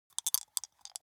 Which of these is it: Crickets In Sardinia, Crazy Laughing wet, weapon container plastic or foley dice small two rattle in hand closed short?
foley dice small two rattle in hand closed short